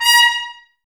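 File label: Brass trumpets A#, Mute; Miroslav Vitous - Woodwind Brass Ensemble; Tps Mute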